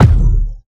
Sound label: Player Head Collide